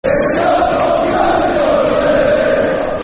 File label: Bounce Or Yer A Blade, Football Chants; Sheffield Wednesday Swfc